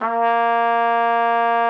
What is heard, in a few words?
TROM A#3, TRUMPET S; Xsample VOL-3